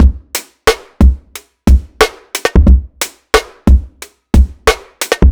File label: Jungle Snare